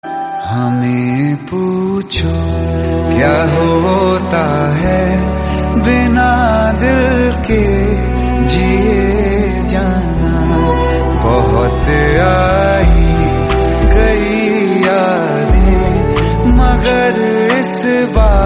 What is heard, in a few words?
trending tik tok sad